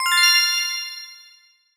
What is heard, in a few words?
goal; HotCustard; Beat The Safe; safesecurity